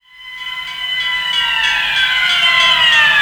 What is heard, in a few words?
FX SIRE; E-Lab; X Static Goldmine 2; akai